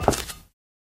black tile, footsteps

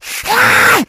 rage at victim, infected, male

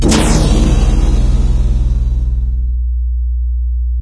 medigun heal, weapons; tf

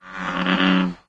bullfrog, frog groups; ambient; random animals; Left 4 Dead 2; Source Engine

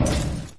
player metal; Source Engine; dod

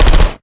turret fire 4x

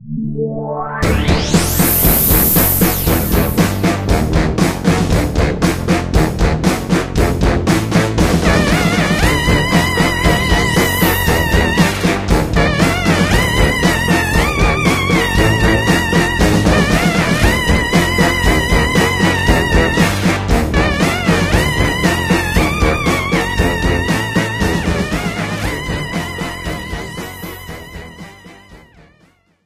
start last wave, music; source engine; tf; mvm

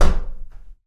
wood door knock

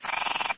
nature question; windows 9x; sound schemes

